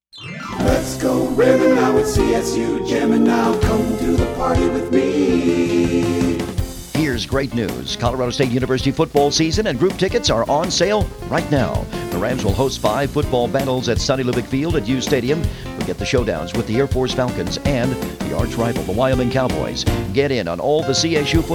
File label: Jingle